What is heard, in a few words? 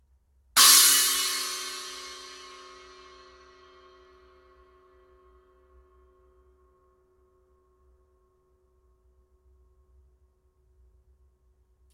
FX 15in China Trash, cymbal sound